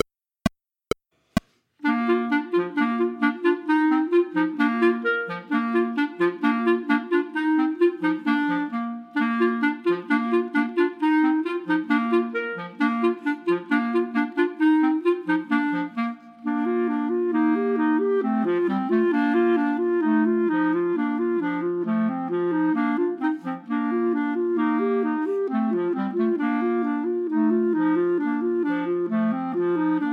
Ecossaise, Chamber Music for 3 Clarinets minus 1st part; Hummel